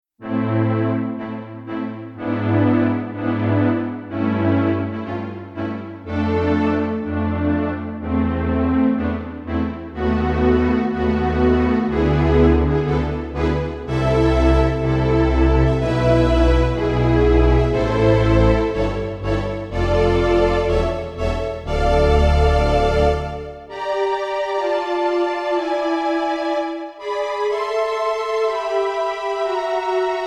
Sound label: Strings